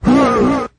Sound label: huh 8 bit arcade guy; ooh ahh; heh huh